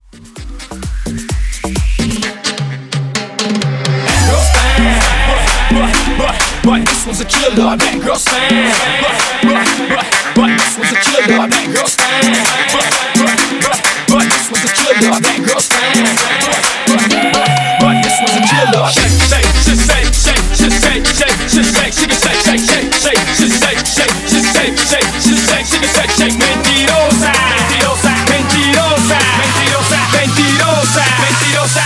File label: Hype Guaracha Edit 129 BPM